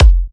Kicks F; markus hakala

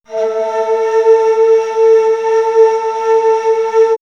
Bamboo Flute; X-Static Goldmine; backtothe70's

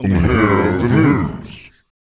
VOICE FX, Dance Vocals

